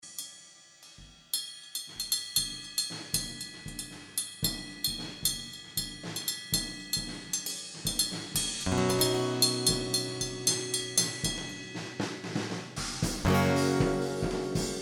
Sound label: Jam; THERMOSPHERE